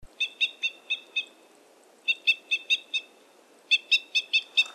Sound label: Red Crossbill; Red Crossbill (NW Wisconsin, near Solon Springs); Red Crossbill call